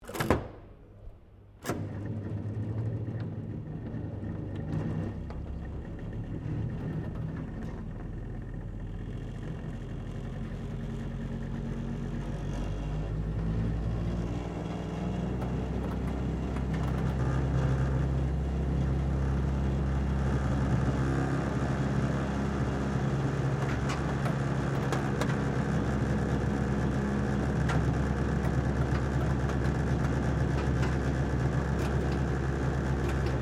SLOT MACHINE MAGIC BALL VACUUM MACHINE OPERATING, Various old coin slot machines